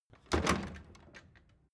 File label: door flap loft impact